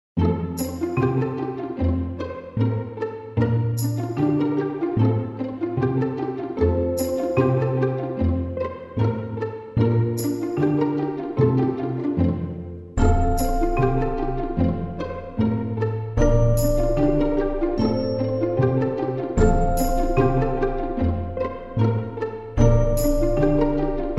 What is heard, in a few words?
Picking Quirky